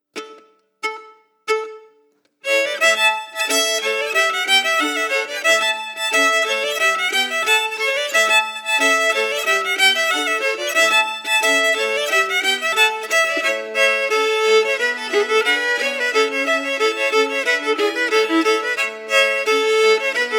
melody emphasized

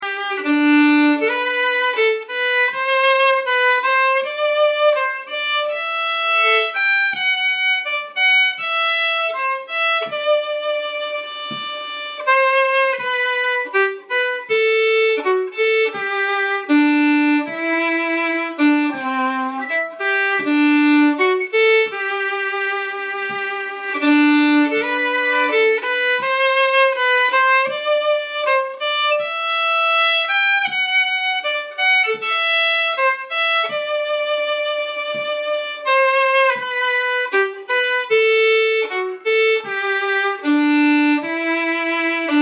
Granary March

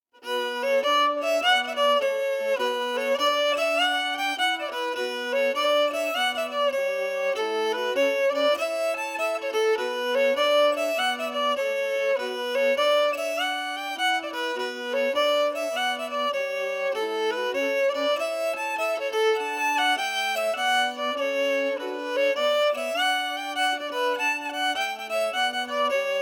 Melody emphasized

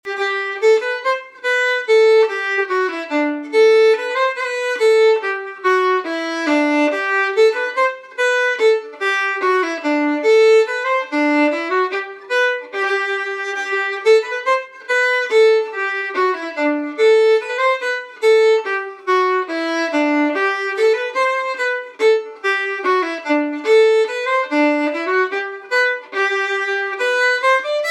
Morses March

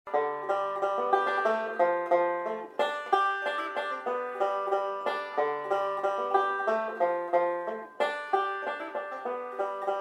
Fiddler Solo Banjo Slow

